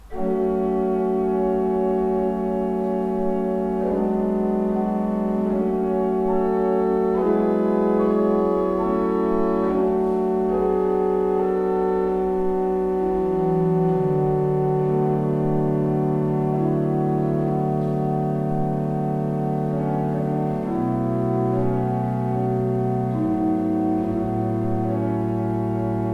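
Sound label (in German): Orgel, pfeifenorgeln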